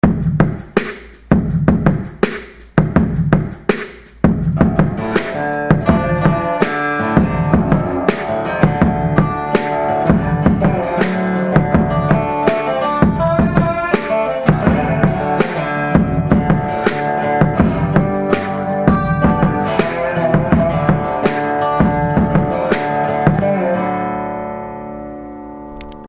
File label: dobro beat; resohangout